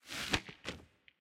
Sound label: logo in; druids dream